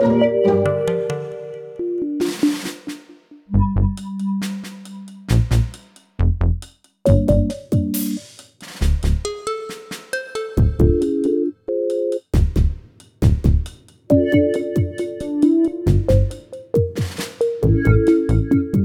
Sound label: mus front end splash; Plants vs. Zombies Battle for Neighborville